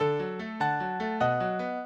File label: minuet, mozart